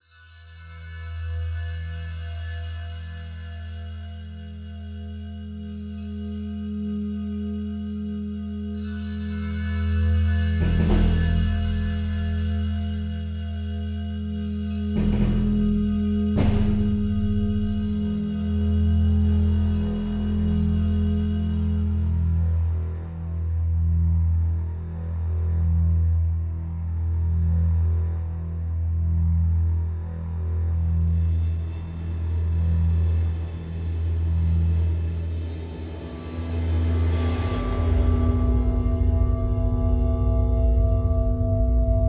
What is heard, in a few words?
Metallized Noise; zombotron